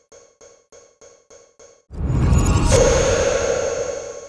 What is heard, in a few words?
Magic hitpoint; Eft